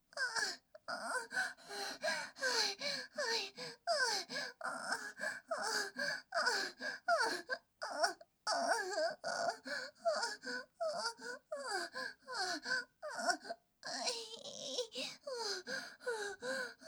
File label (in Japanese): 人妻の喘ぎ声, ASMR